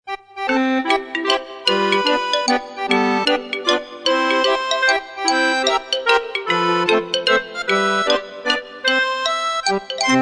birthday jingle

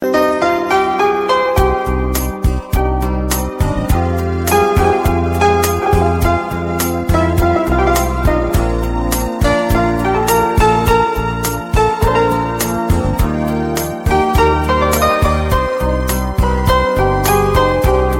instrumental ringtone

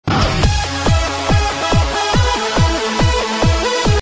techno part ringtone

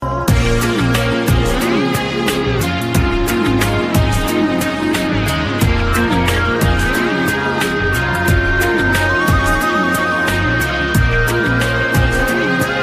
guitar ringtone

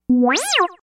wow sound

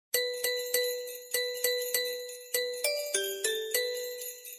best short sms alert tone ringtone

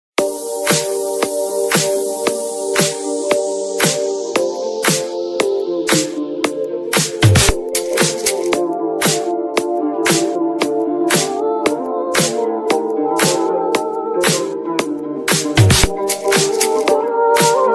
iphone 7 ringtone

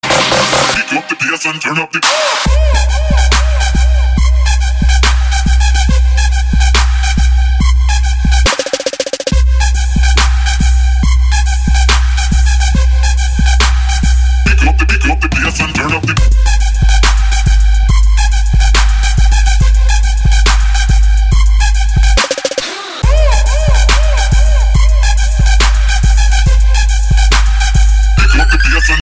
pub music tone

